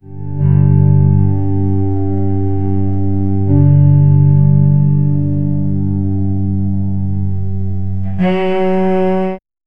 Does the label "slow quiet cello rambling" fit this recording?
Yes